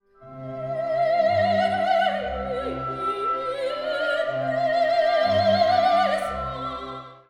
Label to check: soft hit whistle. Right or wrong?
Wrong